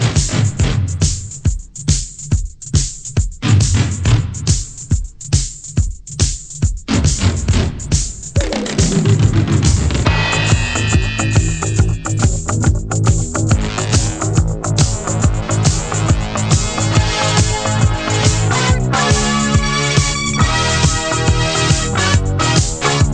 disco

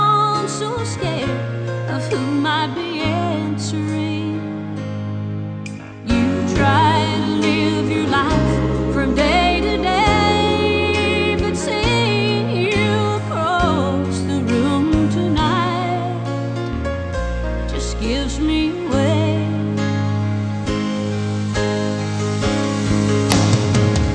country

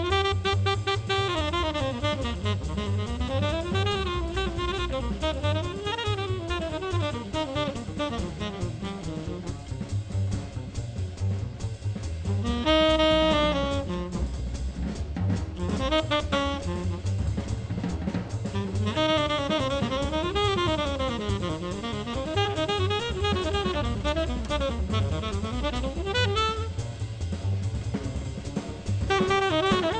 jazz

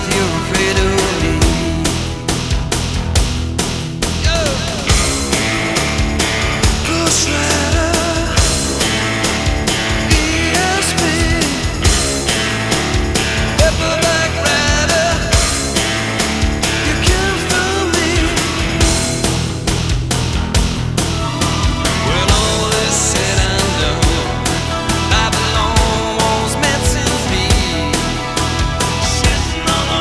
rock

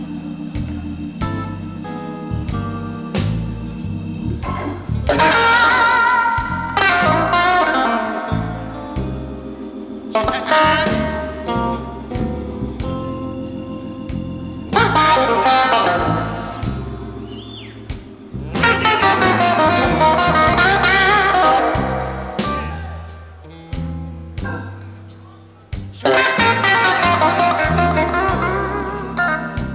blues

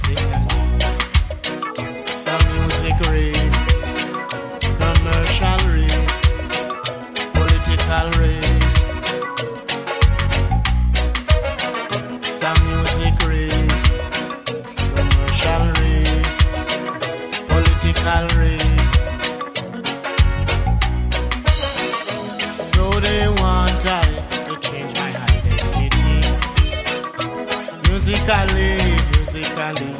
reggae

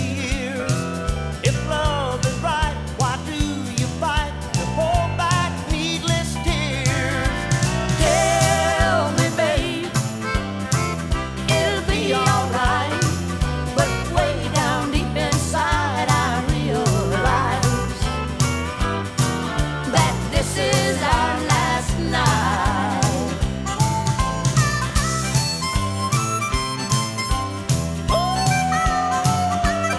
country

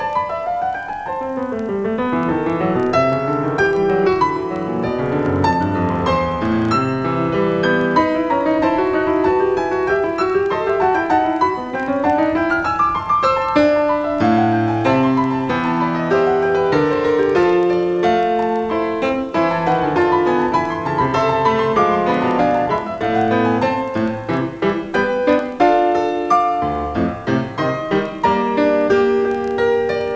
classical

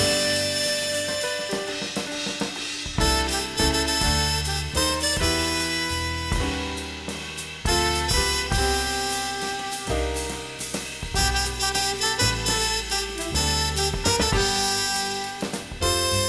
jazz

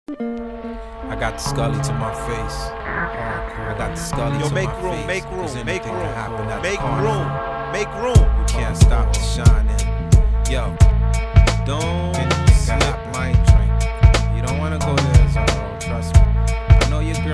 hiphop

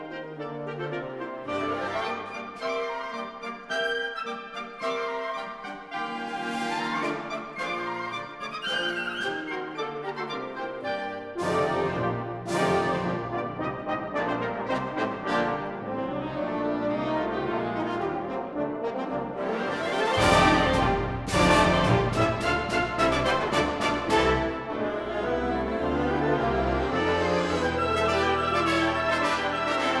classical

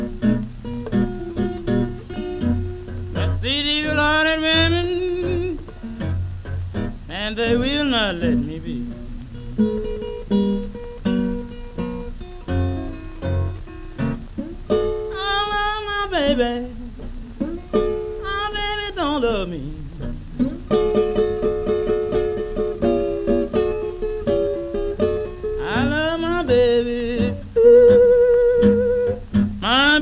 blues

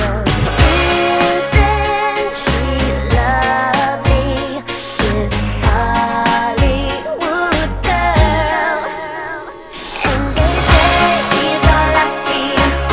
pop

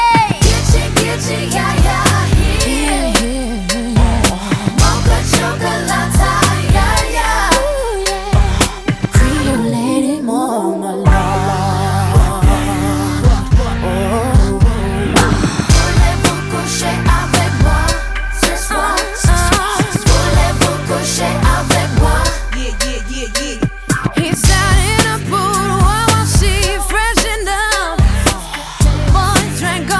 pop

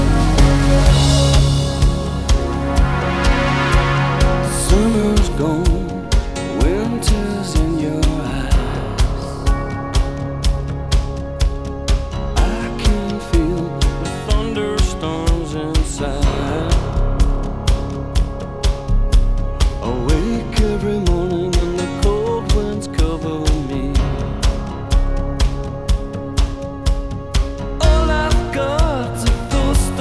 rock

